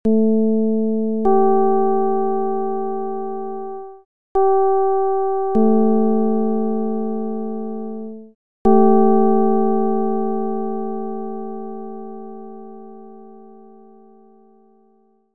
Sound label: csound foscil 220hz